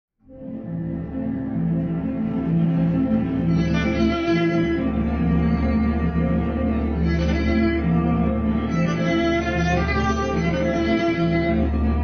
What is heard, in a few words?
waltz